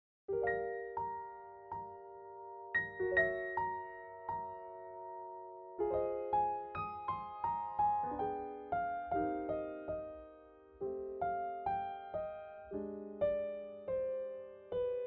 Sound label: Pop Arturia American